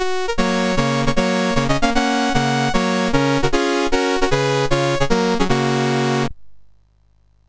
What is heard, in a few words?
sid MOS6581R3; Games Summer Edition menus